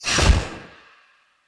Charged Punch Whoosh; Weapons; Unarmed; Fey

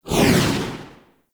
Motorcycle Passby Whoosh Medium; Warframe; Motorcycle Ambience

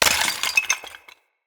Vase Clay Small; Vases

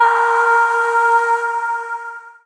Void Shawzin Oct Two A Sharp; Warframe; Zariman

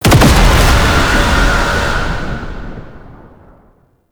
Uriel Brimstone Cast Impact; Warframe

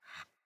Puppy Idle Pant Short; Enemies; Vocals; Kubrow

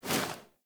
Generic Weapon Aim Transition Out; Warframe

